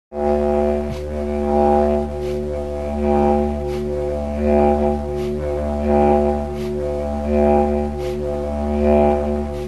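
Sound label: breath, didgeridoo; Circular Breathing; Timeless Productions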